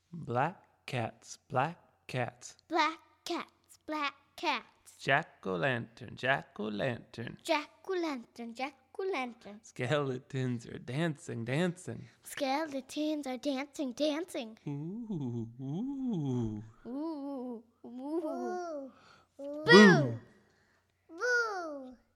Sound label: halloween chant